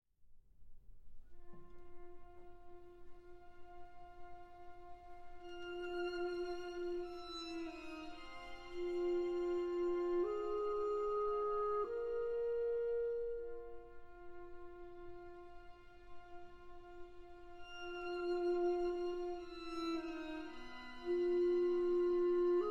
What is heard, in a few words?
Première rapsodie in B-Flat Major (Orchestral Version); Debussy; CD 124, L. 116